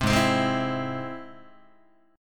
Ab Augmented Ab Guitar; Ab+ strummed; JGuitar

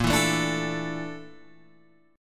A sharp Major 7th Flat 5th, Guitar Open G

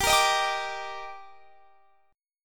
D sharp Major 7th

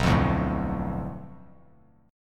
B Minor 7th Flat 5th B Bass Standard, chord; Bm7b5; strummed; JGuitar

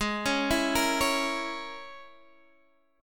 E 6th Flat 5th Ab

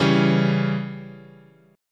Db Diminished, chord

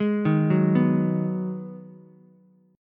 Ab Minor 7th down Ukulele; arpeggiated; JGuitar

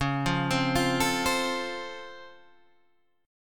Db Augmented Major 7th, down, Guitar, Standard; arpeggiated; JGuitar

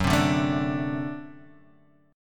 D 7th F sharp, guitar

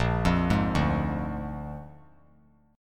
Bb 7th Bb down 3C,3F,3A,3D; arpeggiated; JGuitar

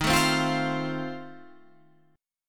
F Major, guitar chord; F/Eb strummed

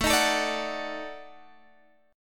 Eb Minor Major 7th Flat 5th

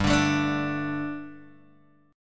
G Suspended 2nd G, Guitar Open G; chordshape; down

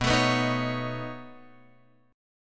B 9th B down Guitar Open F; B9 strummed; JGuitar

